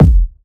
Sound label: Kick - F; Kicks; Future Bass; Drums; One Shots